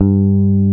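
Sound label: G2 FINGER BASS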